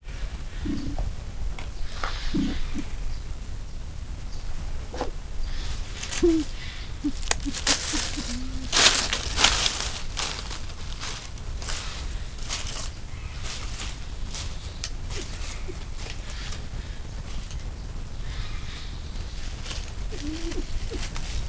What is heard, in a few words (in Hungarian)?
száraz leveleken lépked, ezüstfácán; miskolci zoo 2009